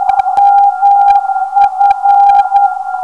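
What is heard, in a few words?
419.5kHz, non-directional beacon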